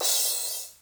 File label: Crash Cymbal, Yamaha EMR-1